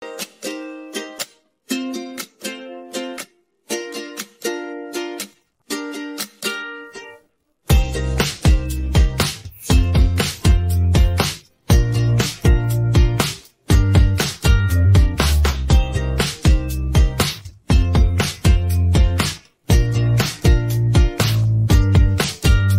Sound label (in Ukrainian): Нова українська музика мінус караоке; Нова українська музика 2022 мінус караоке